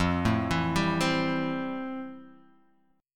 F Major 9th F, Guitar, Open D

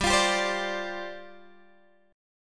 G 7th Suspended 4th G down Guitar Open G

